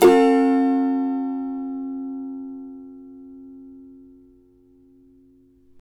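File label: G major, Guitars; Spectrasonics; Hans Zimmer Guitars VOL-2; CAVA CHORDS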